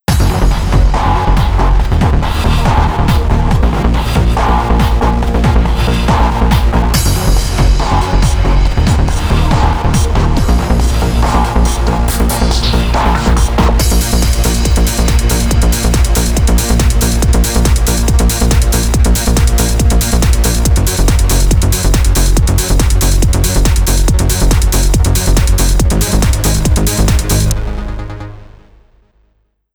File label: drumbass